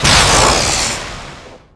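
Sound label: enemy crash; Crash Bandicoot The Wrath Of Cortex; XBOX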